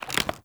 Foley Melee Swing; Characters; Enemy; Mockeries

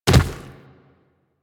Infested Medium Land Dirt, footsteps; Warframe

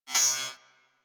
Beep Comby; Warframe; Ambience; Corpus; Beeps

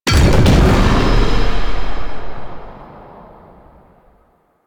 Hazard Fracture Activate; Warframe; Duviri; Undercroft Hazards

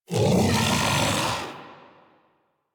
Kubrow Armored Vocal Alerted, Enemies; Warframe

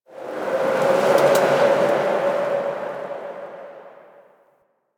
Exterior Forest Wind; Warframe; Ambience; Duviri

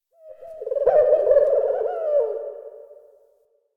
Tree Critters Scared, Ambience; Props; Warframe; Duviri

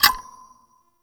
Sticky Bomb Detonate; Grineer; Veilbreaker

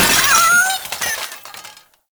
Cat Loot Crate Destroy; Warframe

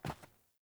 Grunt Boot Walk Default, Footsteps; Characters; Enemy; Melee Grunt; Boots; Sinecure

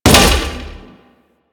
Grenade Bounce; Warframe; Weapons; Corpus

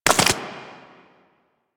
Proto Infested Maggot Step; Warframe

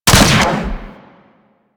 Fire Shot, Rifles; Snipetron

